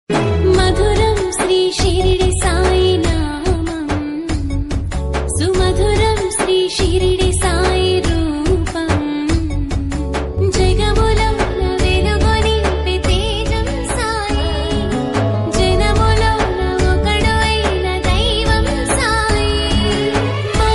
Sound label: ringtone; sai baba; telugu